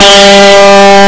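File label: 60s Rock Guitar, Heavy overdrive guitar G3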